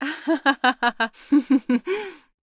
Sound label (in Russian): Смех Фраза